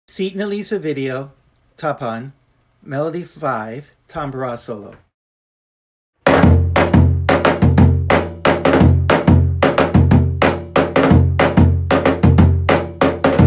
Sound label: tapan melody